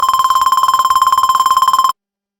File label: digital slot machine